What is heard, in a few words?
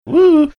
singing "woohoo"